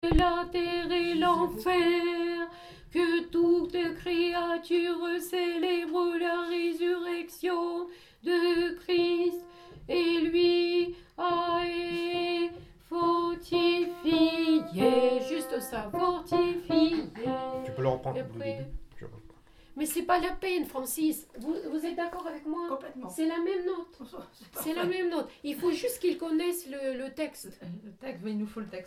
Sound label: Soprano; Ode3 Hirmos versets; Etude Vigile et liturgie pascale paroisse Christ Sauveur